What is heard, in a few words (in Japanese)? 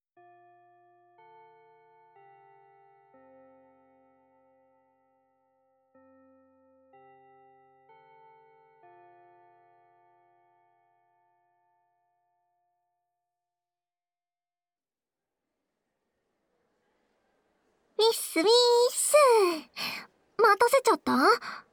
まずは……軽くちゅーしてみよっか（普通のキス、はむはむキス）, ASMR